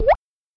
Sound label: mac droplet; twitch